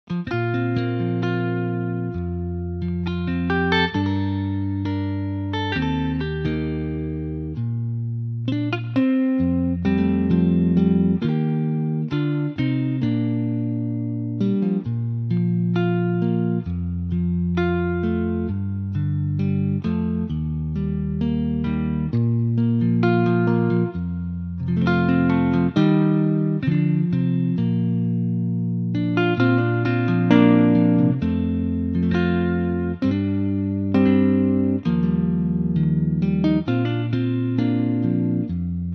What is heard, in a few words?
Bb Sunburst guitar, electric guitar